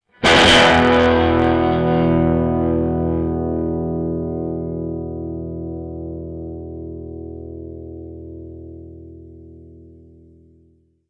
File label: b112 strum